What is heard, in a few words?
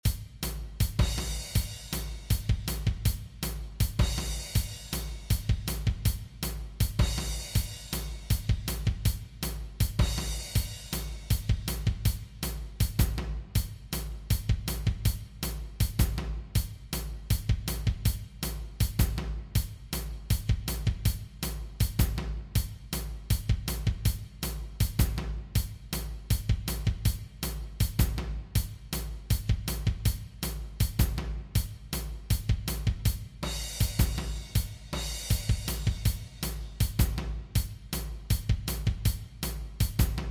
Drum Kit; Prologue